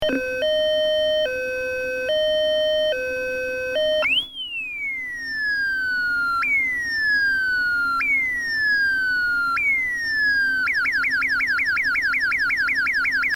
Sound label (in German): Tonfolge Eriston